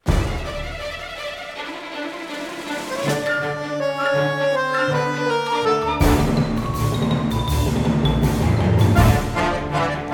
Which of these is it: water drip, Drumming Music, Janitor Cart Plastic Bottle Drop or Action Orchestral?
Action Orchestral